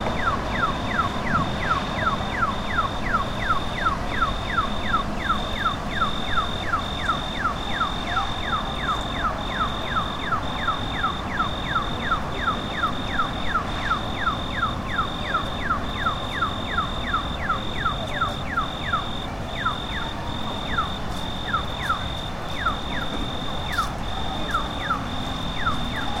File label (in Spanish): semaforo chicharras paseo chopera